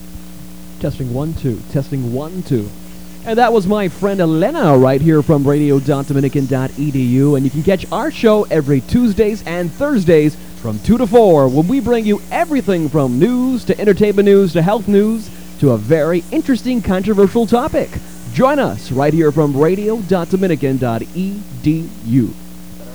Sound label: old vocals